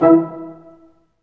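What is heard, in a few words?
Large Ensemble, Brass Staccatos; Roland L-CD702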